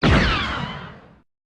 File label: blaster, Guns Fire; Weapons